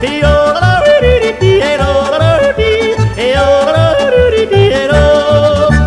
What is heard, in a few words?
yodel